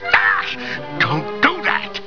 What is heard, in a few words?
error